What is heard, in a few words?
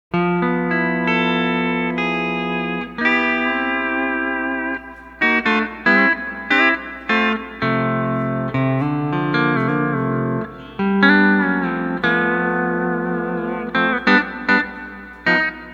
Add 9 Steel C strummed using 1st string